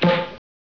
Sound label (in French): feu